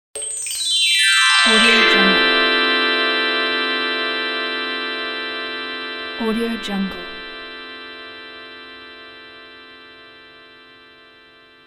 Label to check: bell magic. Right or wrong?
Right